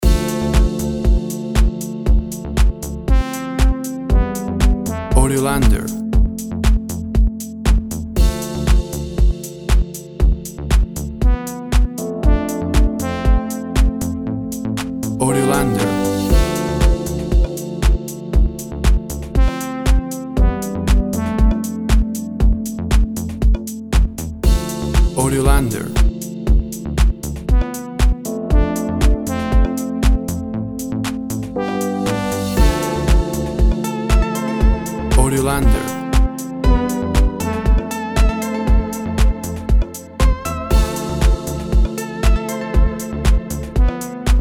Tropical House Groove

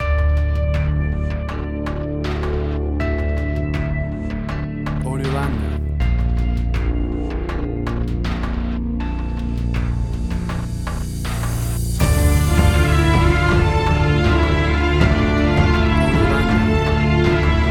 Big Orchestral Finale; Big orchestral finale music sound. A powerful

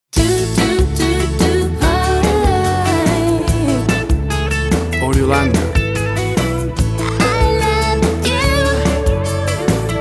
I LOVE YOU STINGER

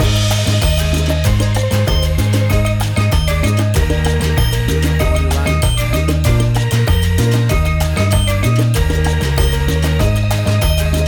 Calypso Island Sunshine Groove

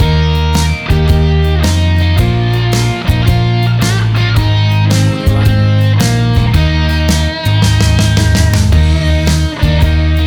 Auld Lang Syne Rock

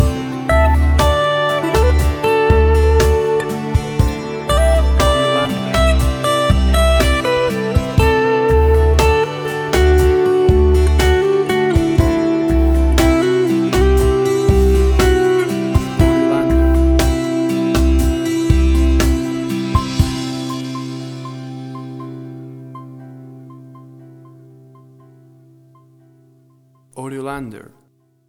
Acoustic Summer Moods

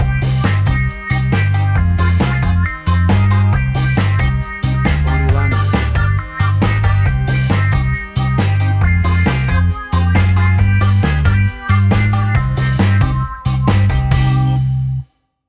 Cool Ska Reggae Carribean Music

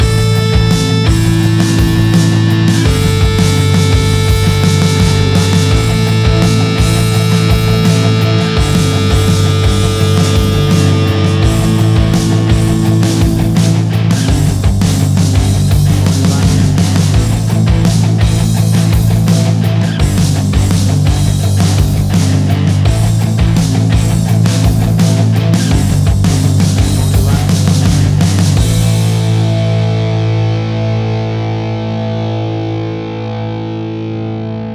Stadium Rock

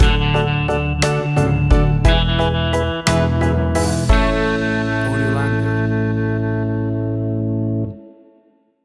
Back to the 50s Stinger; Take me back to the retro 1950s with this classic piece of 5

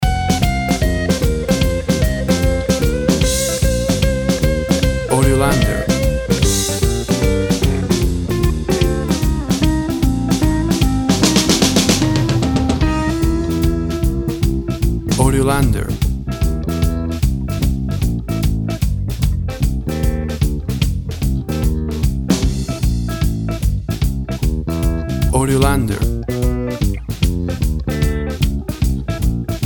Walking Swinging Blues

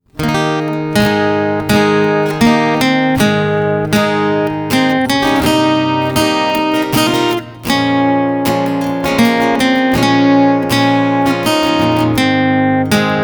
Acoustic Guitar; Away In A Manger

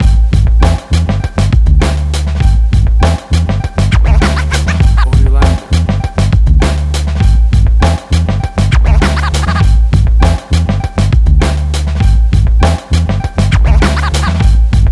90s Hip Hop

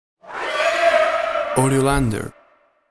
Witch Cry High; A scary and haunting

